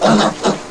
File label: Pigs; PD Pig Out! Vol. VII